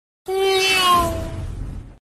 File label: ngiung sound effect